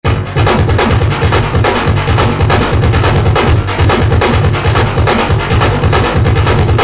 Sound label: 141 rough beat; REMIXABLE COLLECTIONS; Kraddy Collection